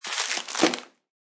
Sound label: Grab Put Plastic Food Wrap, Plastic food wrapping or package such as chips or cookies crinkle; Crinkling; SFXsource